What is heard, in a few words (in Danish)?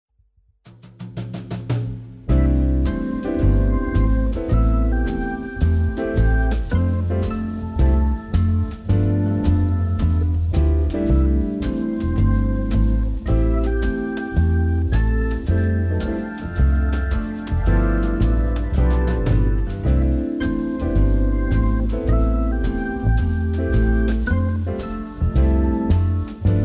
C med melodi; akkorder